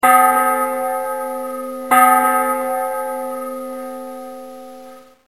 BELL

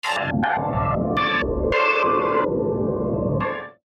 vacuum

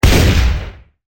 gun shotgun

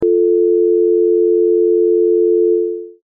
tele dial tone

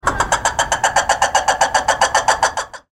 red ruffed pulsed squawks

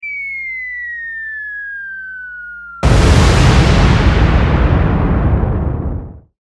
fall explode

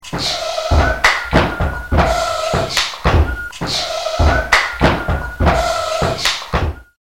mill floor loop

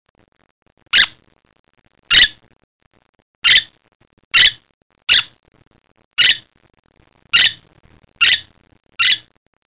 Birds

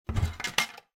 cabinet door open

